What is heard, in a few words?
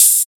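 open hat, Cymbals; Hip Hop and Trap; Ghosthack; Hip Hop and Trap Freebie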